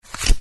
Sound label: on roll; barbie; puppy water slider